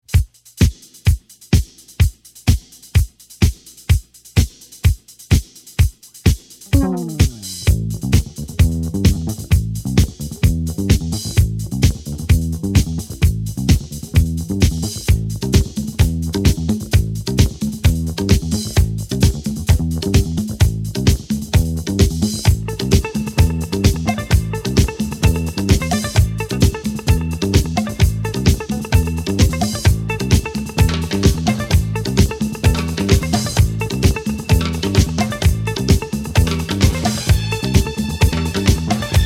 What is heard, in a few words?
Space Bass